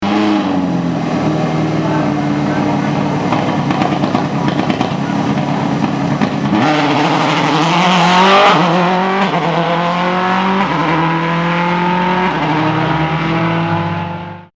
Subaru Impreza WRC, start; monza 2007

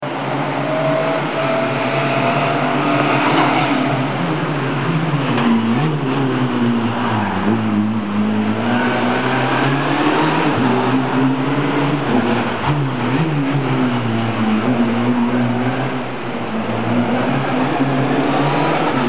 Fiat Punto JTD; Gatti

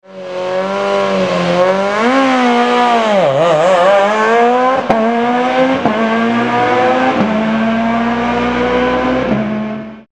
Peugeot 207 S2000, start; monza 2007

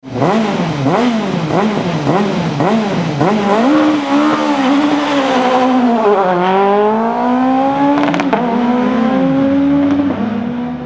Citroen C2 S1600, start; monza 2007

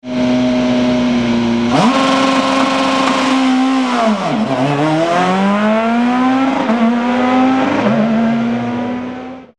Fiat Grande Punto S2000; monza 2007

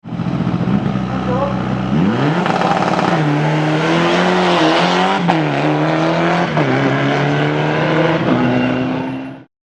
Mitsubishi Lancer EVO IX; monza 2007

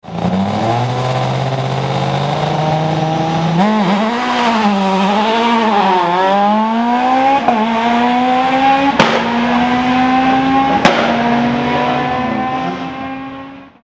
Renault Clio S1600, start; monza 2007

